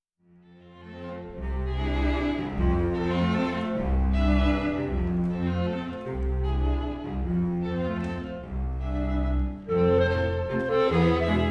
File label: Divertimento for Clarinet; clarinet and string quintet